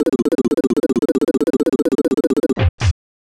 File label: Unused Jingle; Super Double Yakuman 2; SNES